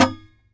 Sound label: SAZ TAP; Spectrasonics; Hans Zimmer Guitars VOL-2